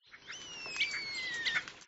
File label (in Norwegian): stær